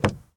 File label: Truck Durango Door Open; Trucks